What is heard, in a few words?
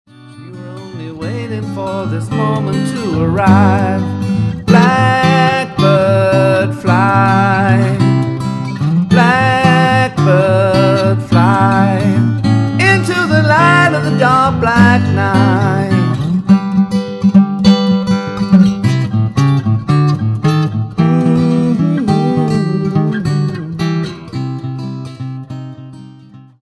blackbird